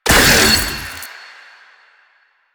Verglas Prime Shot, weapons; DE; Warframe; Sentinel

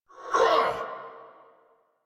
Attack Effort; Warframe; Dax Knight; en

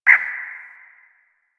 Cactus Wren Bird; Warframe; The New War; Zariman; Apartment

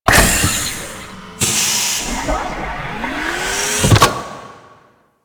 Pump Movement; Ambience; Warframe; Grineer Settlement

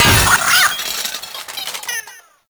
Cat Loot Crate Destroy; Warframe

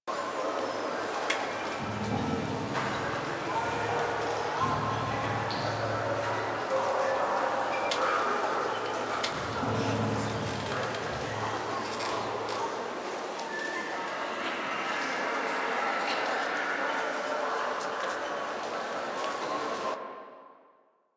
Side Room Loop; Warframe; Venus Open World; Venus Solaris United

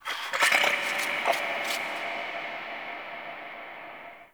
Small Creature; Ambience; Dungeons; Under City

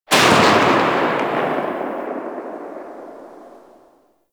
Shot Tail, Weapons; Warframe; Gorgon

